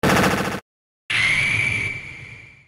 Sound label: home run bat; ssbu